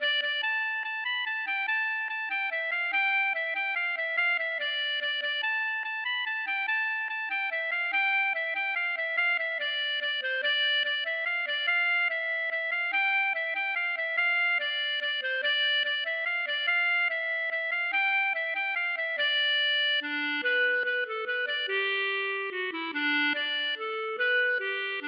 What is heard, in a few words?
mazurka